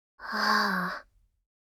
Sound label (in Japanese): はぁ…